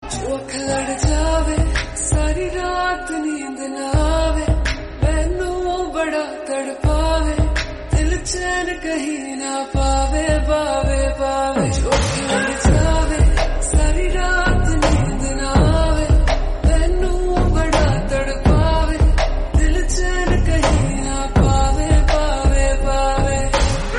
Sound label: Slowed Reverb; Loveyatri